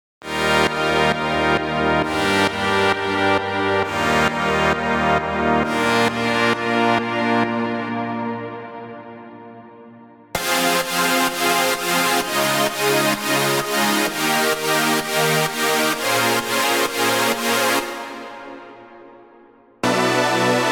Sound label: Chords Trance & Psytrance; Unison; MIDI Wizard